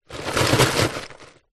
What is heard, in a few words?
ice pieces small hundreds movement in plastic container; nature